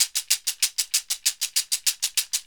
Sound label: ABACUS LO; ILIO; Synclavier Percussion 2; World & Orchestral